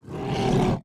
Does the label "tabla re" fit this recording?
No